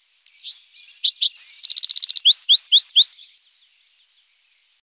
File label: Be Wren